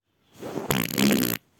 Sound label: long fart